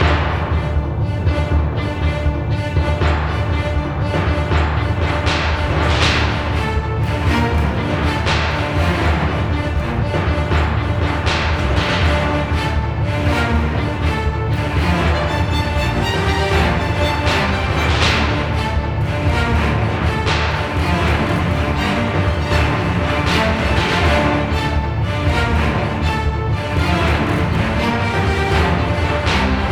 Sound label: Epic Combat LOOP